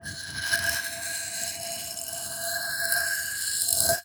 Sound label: Wooden Bar Wire Brush